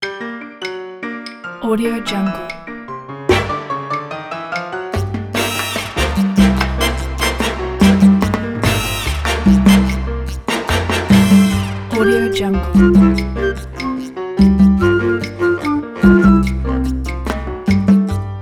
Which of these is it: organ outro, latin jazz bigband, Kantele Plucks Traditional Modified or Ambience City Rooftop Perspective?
latin jazz bigband